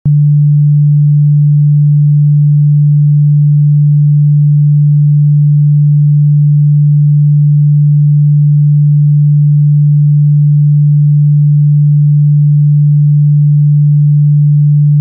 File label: 417 Hz Sine Tone; Amplitude 1